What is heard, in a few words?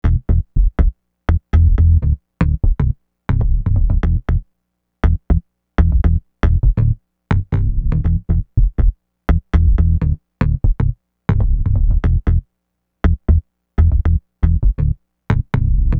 Bass, RnB